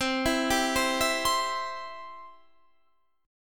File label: C Major, C, down, chord; arpeggiated